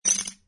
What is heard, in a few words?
chips